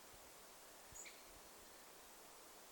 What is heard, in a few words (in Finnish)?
nuori punarinta